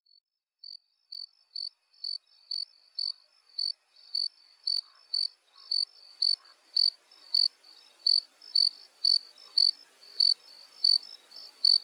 Cricket Single Chirp; Project Zomboid v41.73

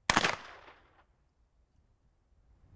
assault rifle distant; Project Zomboid v41.73

